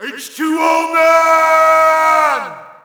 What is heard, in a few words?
Shout, audience; UFC Tapout 2